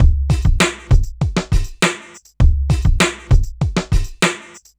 Club Tip, R&B Drums

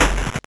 Crushed Perc, bitcrushed; musicradar

